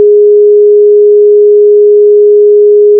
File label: Short Tone G#